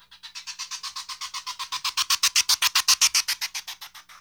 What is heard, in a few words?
TRIL, Percussion; Sampleheads; NYC Percussionworks; 90 s Sample CDs